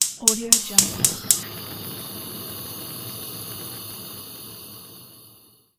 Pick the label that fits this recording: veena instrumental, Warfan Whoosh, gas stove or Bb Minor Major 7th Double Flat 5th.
gas stove